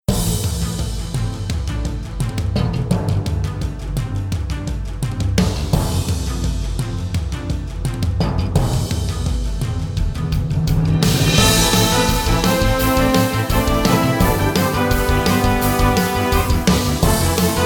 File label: battle sound